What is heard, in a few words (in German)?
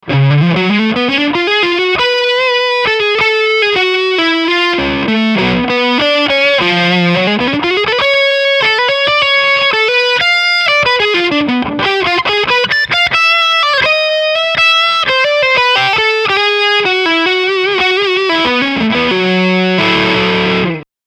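Humbucker Overdrive ohne Booster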